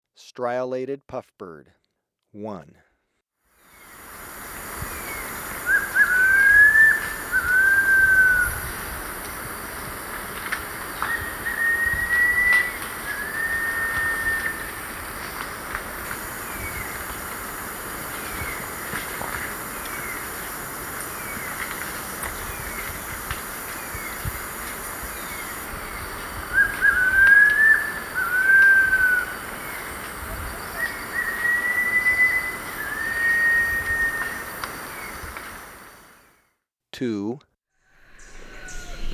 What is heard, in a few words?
Striolated Puffbird, Birds of Ecuador; S. America